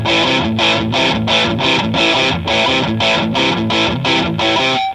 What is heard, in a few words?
crunch, guitar